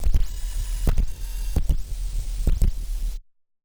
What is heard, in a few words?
Casio, Glitched, malfunctioning synth